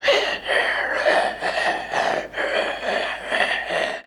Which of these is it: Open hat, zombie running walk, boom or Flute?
zombie running walk